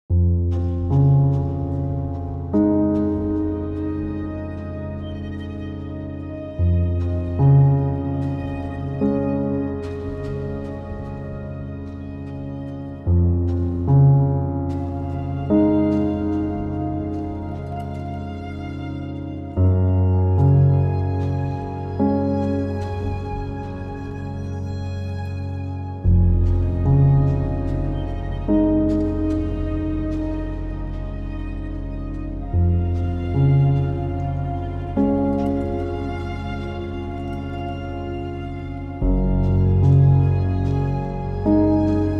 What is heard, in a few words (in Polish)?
piano strojone; Muzyka Ilustracyjna